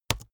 keyboard laptop enter press fast hard; kommunikation; computer

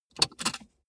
car bmw e46 interior safety belt clasp release

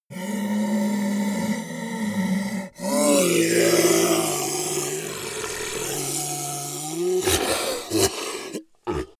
ogre dying groan weak slow

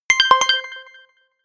notification call

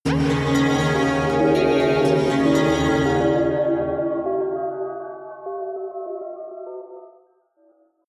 synth bells roll, drones

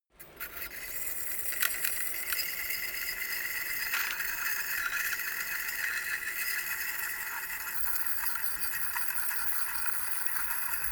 bicycle spin wheel scratch metal; fahrrad; verkehr transport

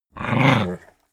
chihuahua snarl fight soft; natur-tiere; hunde

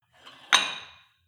weight rack insert weight hard, fitnessstudio

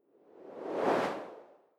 whoosh simple incoming; swooshes-swishes

